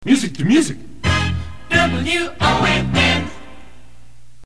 jingle music 2 music; WONN

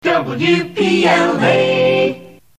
jingle acapella; WPLA

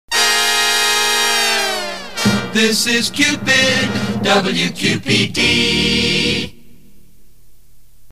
jingle This is Cupid; WQPD

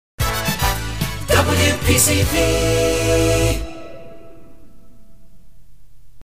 jingle; WPCV; WPCV sig

